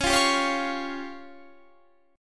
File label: C sharp Major Flat 5th, Guitar Standard; strummed; chord; JGuitar